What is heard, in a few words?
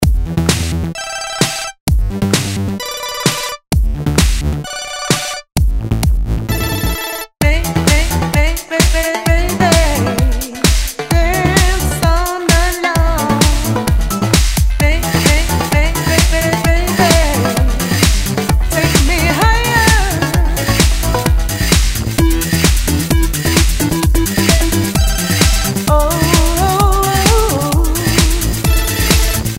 Ringing to you; Samsung; GT-S5520 Nori